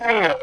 damage; butoijo

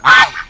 frog damage

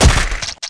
venom splasher; assasin

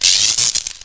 attack; acidus

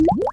hop; yoyo

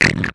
cornutus damage

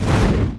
meteor storm buster; hyper novice

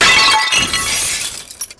acidified zone

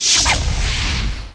ABYSS FLAME

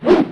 attack mace

